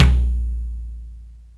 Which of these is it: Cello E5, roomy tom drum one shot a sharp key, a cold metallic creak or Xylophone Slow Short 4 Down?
roomy tom drum one shot a sharp key